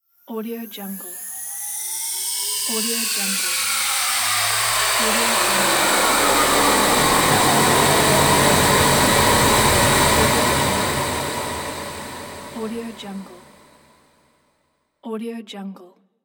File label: long sweep; gadgets-sounds